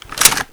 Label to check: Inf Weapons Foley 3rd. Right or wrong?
Right